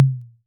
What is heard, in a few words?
Conga Low, Korg KR-55B